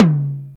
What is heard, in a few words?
verby tom sound C# key, Royality free tom single hit tuned to the C# note. Loudest frequency: 429Hz